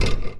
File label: basketball rim; Looney Tunes - Recess